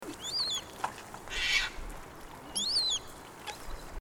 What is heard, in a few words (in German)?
Raubseeschwalbe, Echinger Stausee; Echinger Stausee LA; Susanne Rieck